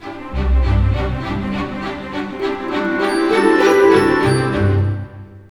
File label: BIKE; East West; Scoring Tools; ORC; Bike Ride